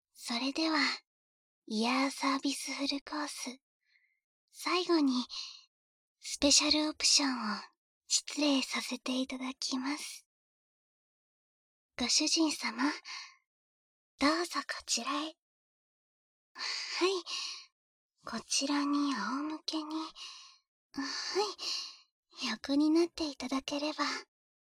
くちゅ音無し, 右耳舐め; 右耳舐め手コキ

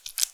くちゅ音単発

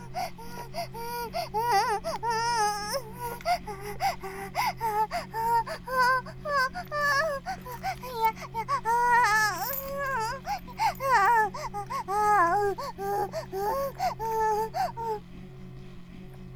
バイブ放置; イクたびにわけて

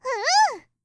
ふ～！

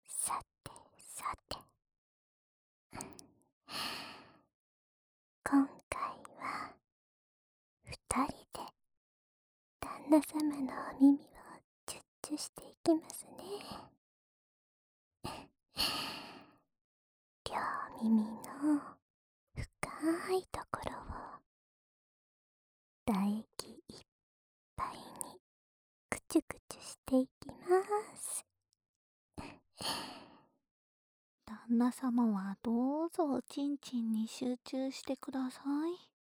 オナニー用耳舐めループ 両耳; 金銀耳かき; 耳かきリフレ『春乃撫子』へようこそ～2人でお耳と股間を幸せにする、贅沢お泊りプレイ♪【6時間の癒し】

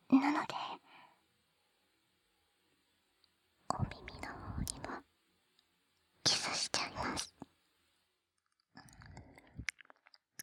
耳舐め, ASMR

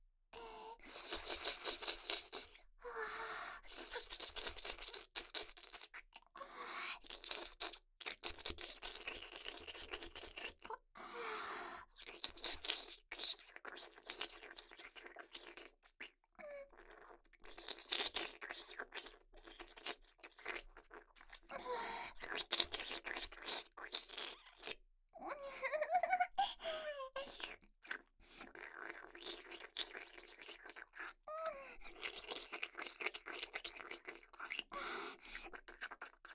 ぺろぺろ

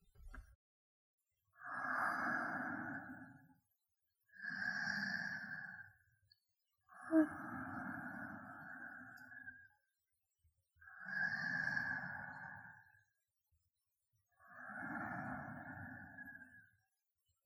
寝息

